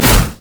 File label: mage chains; LoL; ironforge xerath